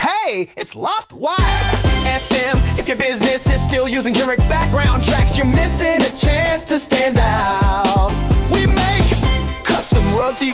Cheesy, Light-hearted jingle style with playful energy; 30s cut; Music + voiceover demo